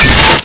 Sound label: melee axe, weapons